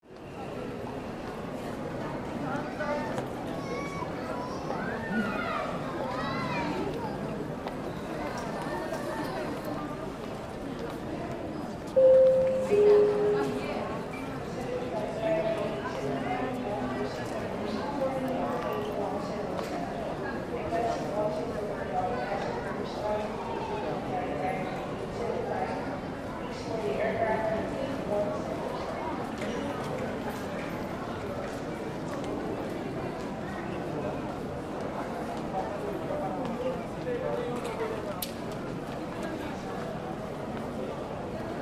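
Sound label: Airport ambience people walking by PA announcement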